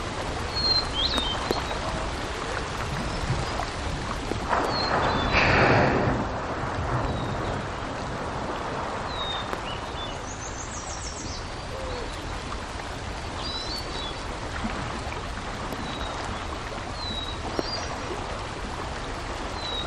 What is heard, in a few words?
Humpback Whale With Birdsong in Background; Alaska; MAMMALS